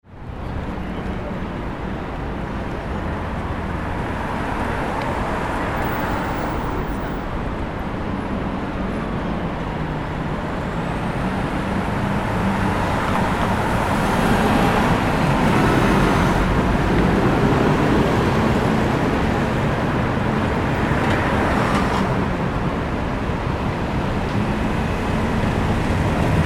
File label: Street Corner